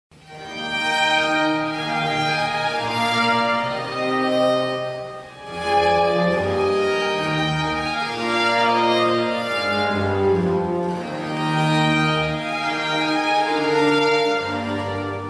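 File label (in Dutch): aria voor alt en ensemble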